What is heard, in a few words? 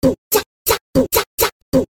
Beat